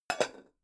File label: pan close chink soft